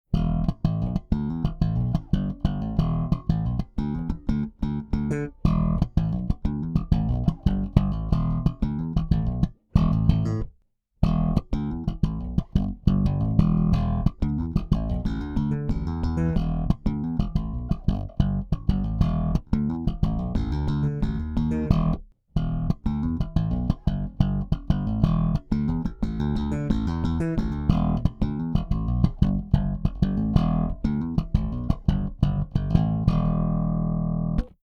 P3110 DI EQ Bass Null High Voll Phat null mid voll; Bogart